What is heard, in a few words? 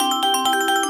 win incrementing; Super For